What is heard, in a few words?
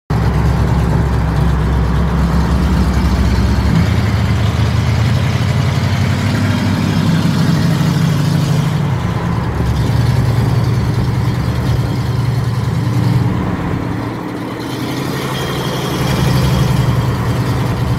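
Pontiac, exhaust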